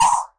Elektron Monomachine, Drum Machines

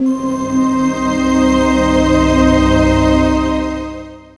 A, Pads; Zero-G; Total Drum Bass

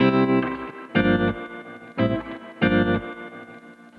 Trem Guitar, 70s Analog Funk

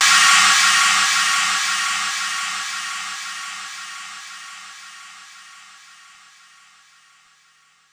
Cym China Loop, Korg 05R-W Korg Cymbals; 300 Drum Machines

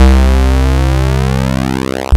SAW

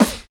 Human Perc, Body Perc; Spectrasonics; Supreme Beats; World Dance

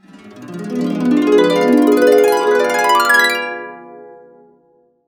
D DIM7 CA UP, CASCADE UP, Complete Harps; USB Soundscan vol.62; AKAI

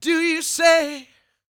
croon, Vocal Planet Blues Soul; Spectrasonics

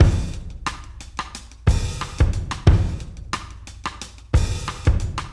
Drums 90, Loops n Lines, 90bpm

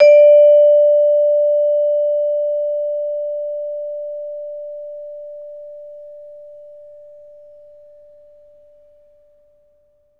VB LONG D4, Advanced Orchestra; Best Service

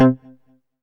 bass BASS; Best Service Gigapack 2

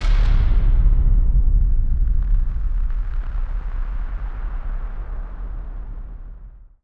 Firework FX; musicradar; bomb factory

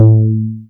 BAS TX-802; Best Service Gigapack 2

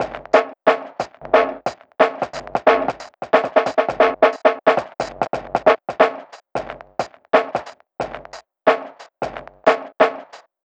matchstick hits 090bpm; Zero-G; Downbeat Dance Grooves

